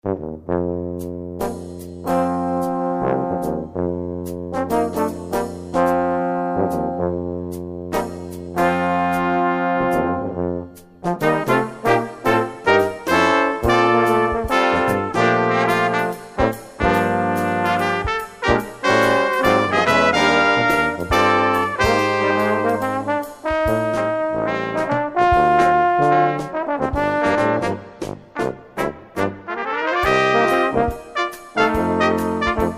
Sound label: Swingin' Brass, kammermusik; 1994, op. 164